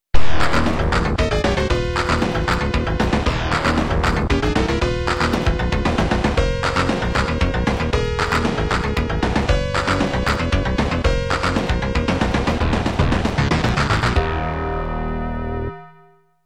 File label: cart music game over; MEGA CD VERSION MUSIC